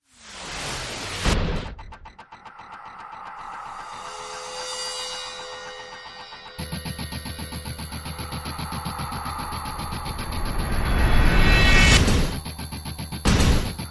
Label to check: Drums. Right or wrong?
Right